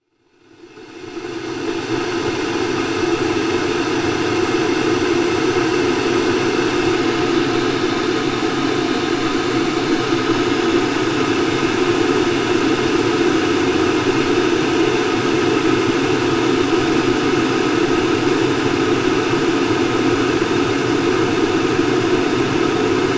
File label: kettle boiling